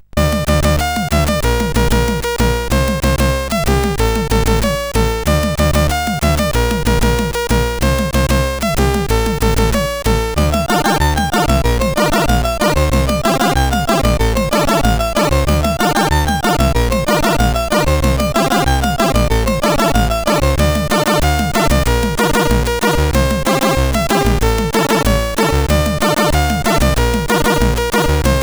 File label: Modulation, sid, CSG8580R5